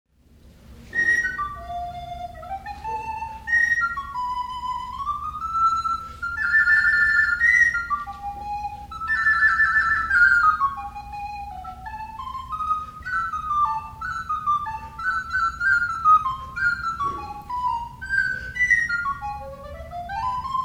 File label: fanfare for recorder